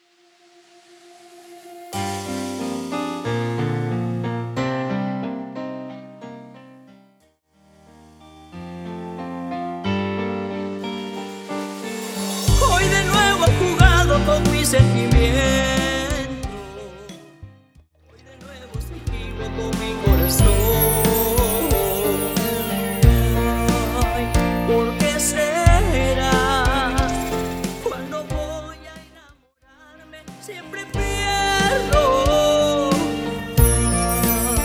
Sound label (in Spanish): Open Show Balada 103 Bpm